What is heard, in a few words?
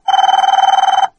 ring